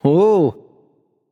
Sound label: happy; smite; voicepack; osiris